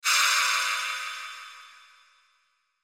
Sci Fi Magical Spell Whoosh Sparkle; Magical Spell Whoosh Twinkle

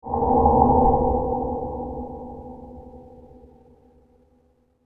Space Subtle Atmospheric Reverb; science fiction sound effect; star wars / trek style games

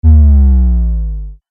Laser Beam Power Down

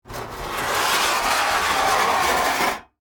Sheet Metal Scrape, Metal, Surface; Factories; BlastWave

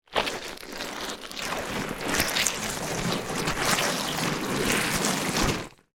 Slimy Movement, Mud; Texture; Squishes; Objects; BlastWave

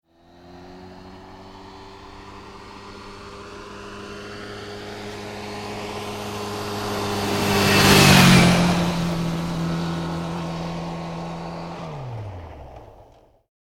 Motorbike Suzuki 650 Pass Fast; Motorcycle; Tom Hutchings

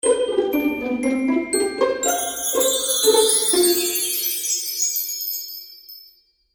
Magical Music Finale, Cartoon Music Sound Effects

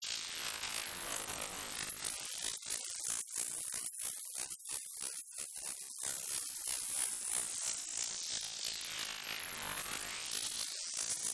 Synthetic Static, Scifi electric buzzing; Static Sound Effects; can also be a strange, buzzing being or contraption, space insect etc.

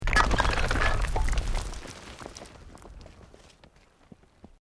ROCK DIRT SLIDE SMALL; Created dramatic effects of buildings collapsing or breaking; Materials

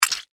Human Zit Pop Squeeze Squish; Objects

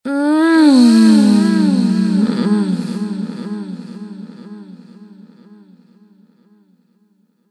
Sex Groaning Woman With Delay

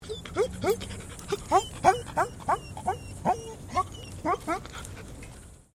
ANIMAL DOG GOLDEN RETRIEVER WHINE; Various domestic dogs; Animals

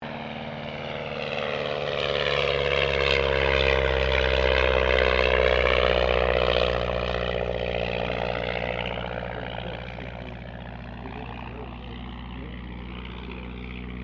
AIRCRAFT DH82A DEHAVILAND TIGERMOTH PASS PA; RAAF Tigermoth; Airplanes

